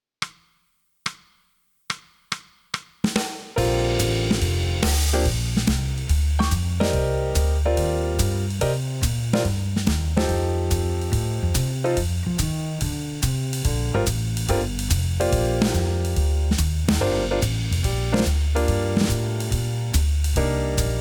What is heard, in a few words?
inst